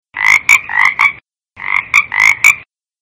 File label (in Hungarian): béka